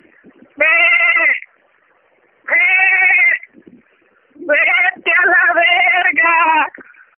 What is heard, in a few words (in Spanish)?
cabra tono de llamada